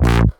Synths C; Vengeance Samples

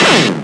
Scratchy, FX; Vengeance Samples

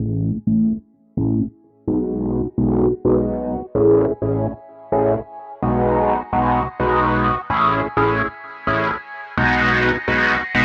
Brass and Strings, 128 BPM; Vengeance Samples; VTDS2 Song Kits

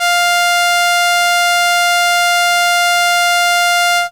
Violin F5, ARP Solina, Unmodulated; musicradar-synth-samples

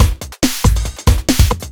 Breakbeat; Vengeance Samples; VEC2 Loops

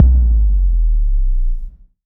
FX Boom

